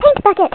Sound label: bucket